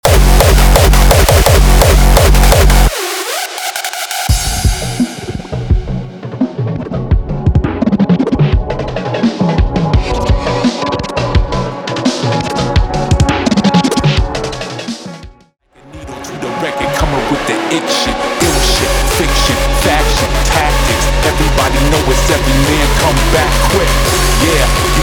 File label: Hip Hop Sound